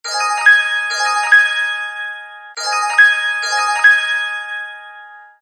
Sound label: Charming intro tone; Samsung SGH-E250i Southeast Asia